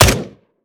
akilo47 smg; weapons; viper

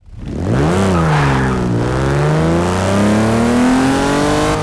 first, vehicles; gmod; tdmcars; jag etype

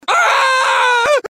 scream, male; gmod; npc

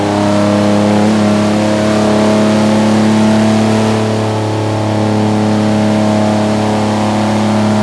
fourth cruise, vehicles; gmod; tdmcars; syclone

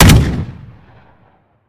weap fire; gmod; weapons

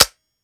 weap fire first; weapons; gmod; viper; scharlie

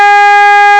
simple, horn; gmod

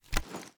reload start, sh; gmod; wfoly plr sh charlie725

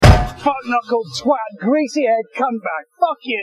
hit head; bmw isetta

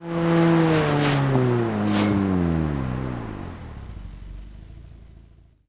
throttle off, vehicles; gmod; tdmcars; rx8

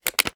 plr sm beta reload xmag mag in; gmod

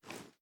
reload xmag arm; gmod; iw8; uzulu

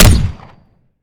sup bang, weapons; viper

